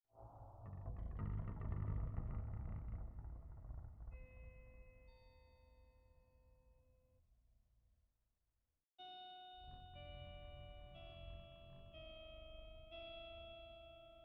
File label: Guitar Piece